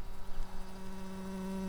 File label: bumblebees, Hypnorum workers